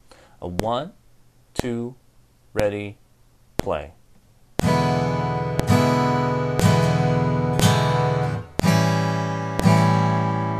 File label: e7 basic blues full chords play along, guitar